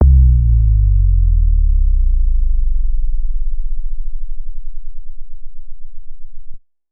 Bass Blower, 808; Street Anthems Sound Kit

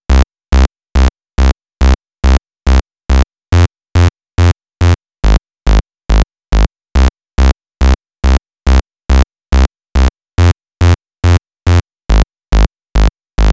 Pitched Bass, 140 BPM; Vengeance Samples; Vocal-Hook Kits; Song Kit; If You Know

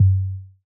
Kick, Trap Foundations Kicks

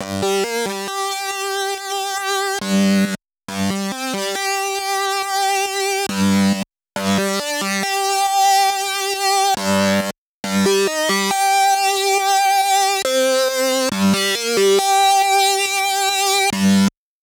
Mainlead Intro DRY 138BPM, G minor; Melody & Synth

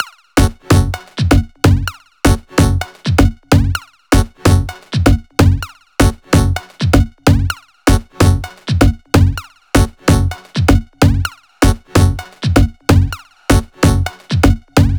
Melody Kits 128BPM Root F#; Vengeance Samples

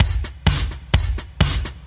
Electro Loop 128 BPM; Vengeance Samples

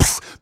Beatbox Snare, Human Beatbox

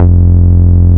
Root F, Bass

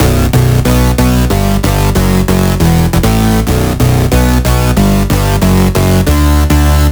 Root B 138 BPM; Vengeance Samples; Special Sounds

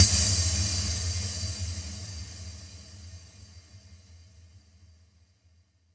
FX Impact; Vengeance Samples

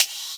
Open Hihat; Vengeance Samples; VEE Cymbals

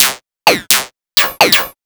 VFX2 Loop 128 BPM

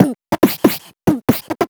Loops BB 140, BreakBeats; Vengeance Samples

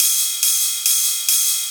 Ride, 140 BPM; Vengeance Samples; Vocal-Hook Kits; Song Kit

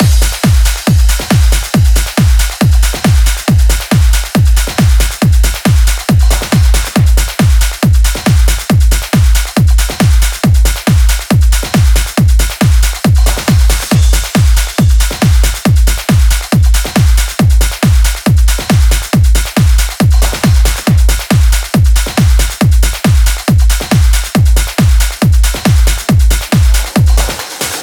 Soothsayer Kit 138BPM Drum Mix 16 bars; Drums & Perc; Bminor

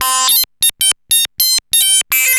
Arp E Line, ARP Odyssey; musicradar-synth-samples

